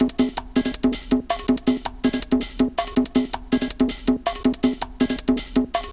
162, breaks; M8

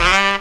sax swing; AMG - Now CD-ROM (Roland); B.2.B. Fun

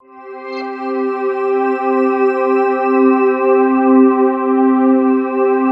PAD, Drum & Bass, 160 BPM

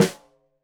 Snare Stereo, Drums; Deeply Sampled Drums; SMDrum

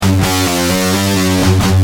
G Heavy, Guitar; Industrial

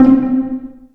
pizz C4; Big Fish Audio; Synth City

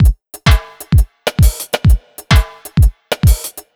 130 jam, Ragga & Dub Reggae; USB Soundscan vol.27; AKAI

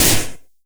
NOIZ PER, NOISE PERC; AKAI S-Series CD-ROM Sound Library VOL-5

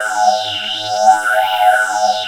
3100 G 3, Korg; Back In Time Records; Korg Universe VOL-1

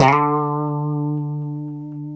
D 3, Guitars; Spectrasonics; Hans Zimmer Guitars VOL-1; 90's Sample CDs; COMP POP+VIB

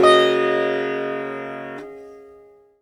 SITAR CHORD; SITAR CHORDS

